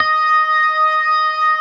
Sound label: STOPS, ORGAN; InVision Interactive; Keith Emerson; ORGAN SPLIT